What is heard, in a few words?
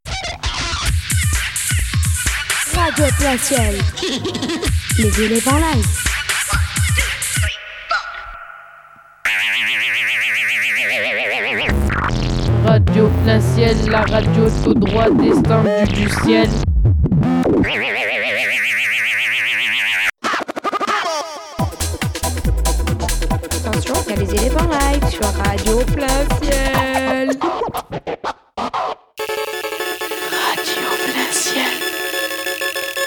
jingle